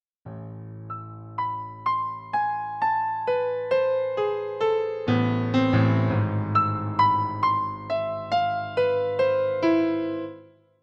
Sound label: Piano